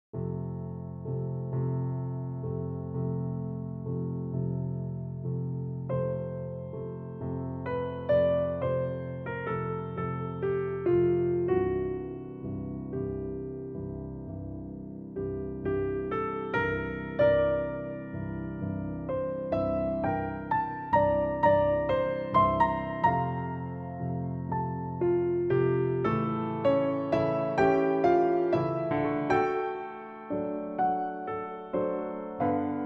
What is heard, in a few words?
Piano Solo; Classical Compilation